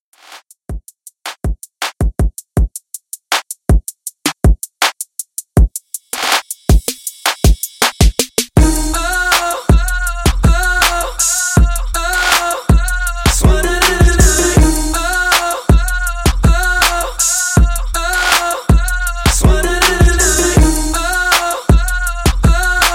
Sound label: Old School 160 Bpm; Redrum